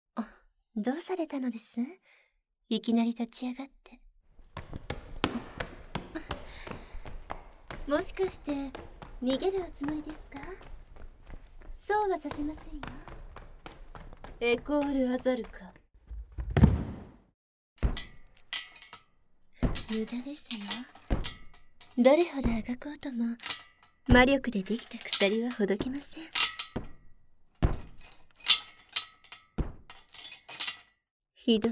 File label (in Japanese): ヤンデレ耳舐め; 悪堕ち×病み堕ち; 堕天使ソフィアの真愛なる懺悔ショー; ヤンデレ耳舐め～愛情と憎悪の狭間で～